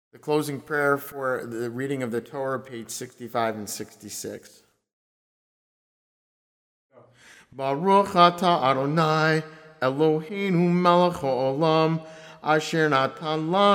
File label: Torah Blessing Close